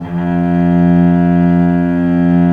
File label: VL F1, VIOLE, Historical Instruments; USB Soundscan vol.23